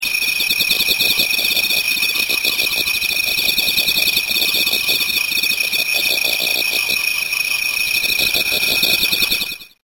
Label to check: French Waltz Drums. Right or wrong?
Wrong